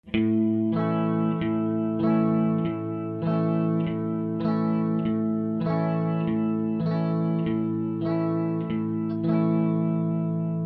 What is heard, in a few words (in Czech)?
3 4 rytmus, kytara